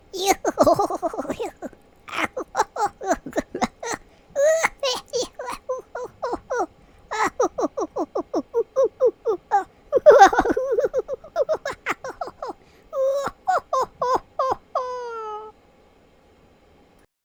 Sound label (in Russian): gnom smeh